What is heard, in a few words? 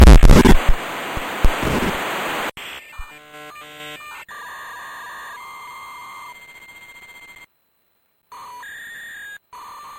jumpscare